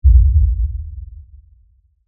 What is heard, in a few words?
LFE Impact; Production Element, Imaging Element, Accent, Transition; Low Impact; BlastWave